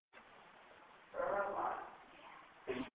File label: cemetery evp